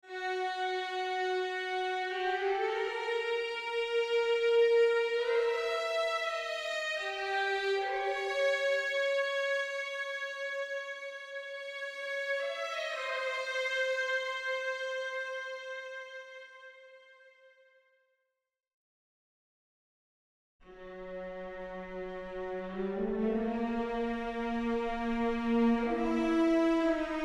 theremin; Gulag Realness

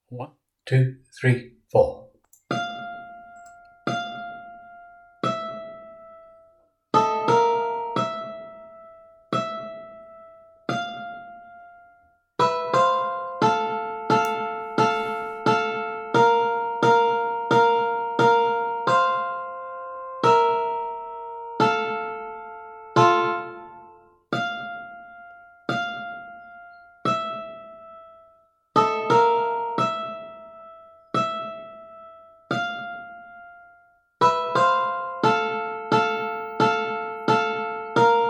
Harmony

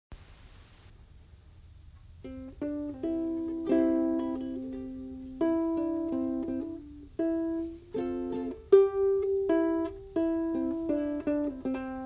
Ukulele

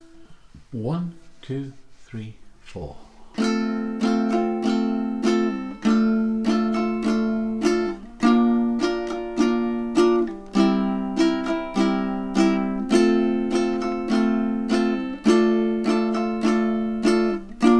Strum; In The Bleak Midwinter

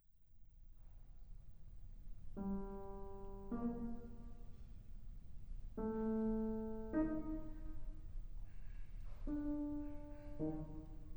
glocken

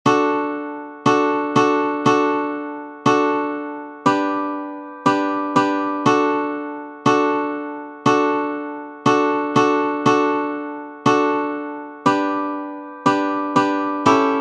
Slow Strum; Coulter's Candy

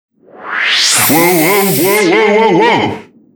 Air High

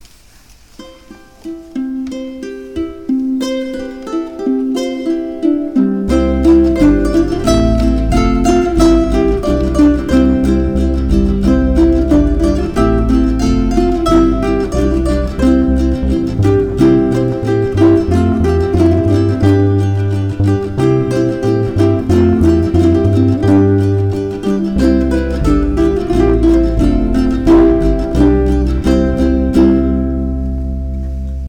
Intro and Instrumental; Sweet Bells

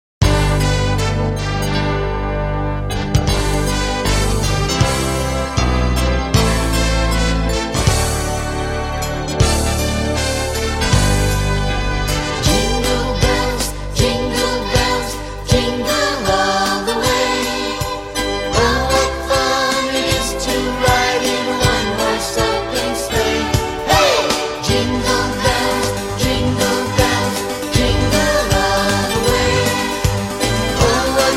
PRE-K Jingle Bells

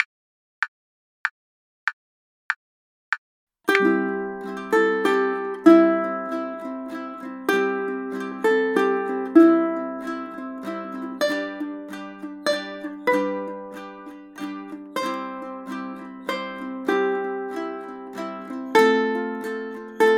melody practice file; Silent Night

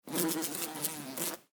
fly buzzing

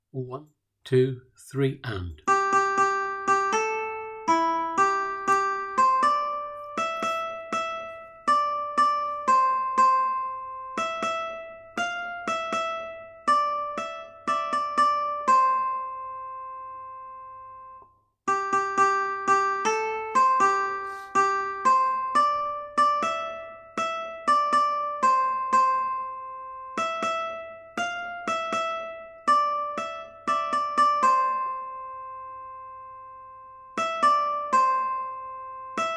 Melody

